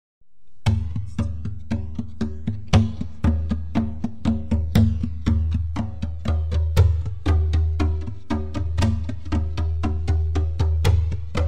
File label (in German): djemben patternmix